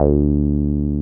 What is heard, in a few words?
303 OW D3P, 303 OW BS; Drums In Your FACE!